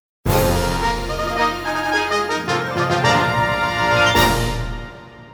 nhac mo dau le trao giai hoi nghi lon

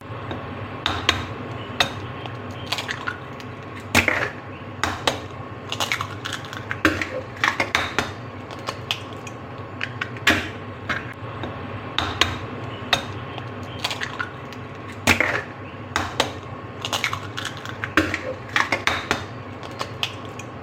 tieng dap trung boc vo do ra nhieu lan

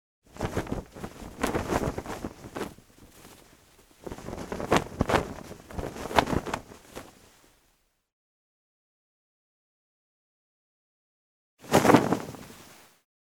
âm thanh tiếng giũ quần áo xếp áo quần

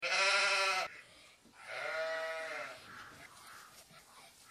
tieng cuu keu

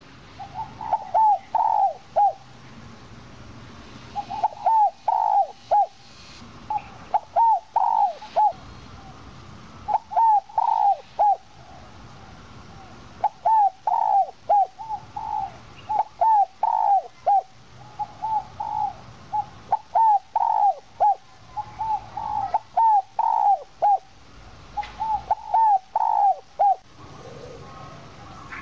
tieng cu gay giong kim

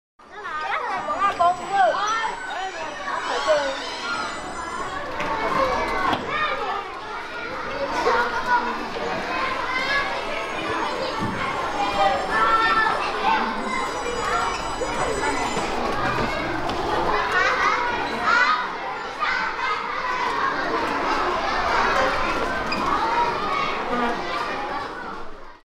tieng on ao san choi truong tieu hoc

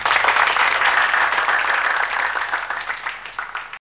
tiếng vỗ tay ngắn

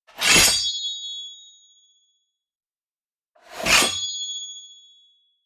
am thanh tieng rut kiem

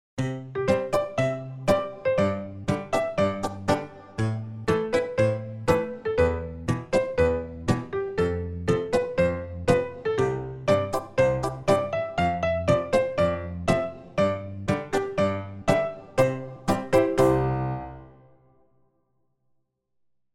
nhac nen hoat hinh vui nhon de thuong

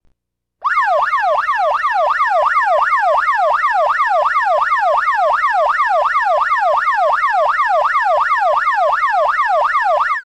tieng coi bao dong xe hoi

